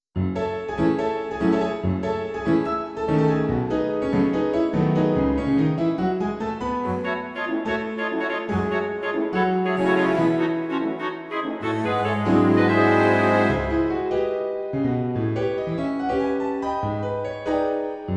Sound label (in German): klavierkonzert